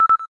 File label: cam timer last, phonetones, LG; LG Wine Smart F480K